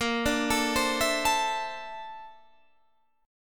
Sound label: Bb Major Sharp 11th Bb down Guitar Standard, chord; arpeggiated; JGuitar